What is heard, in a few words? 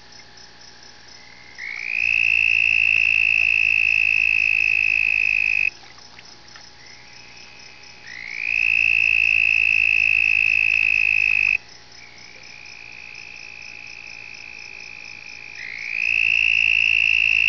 b punctatus, frogs